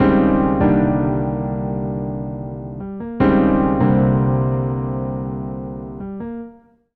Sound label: Chick Riff, Keyboard; Zero-G Smoke